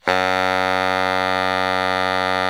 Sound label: baritone FF F#1, Sax; Giga Samples Collection